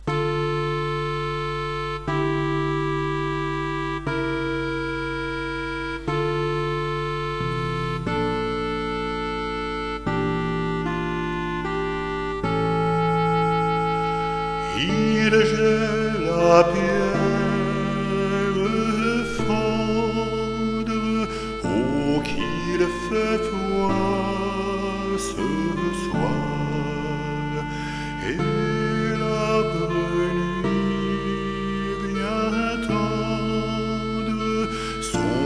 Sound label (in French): Il gele a pierre fendre solo